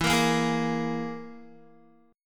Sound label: E Major Flat 5th E down Guitar; strummed; 4F,4A,5C,5G,5C,6E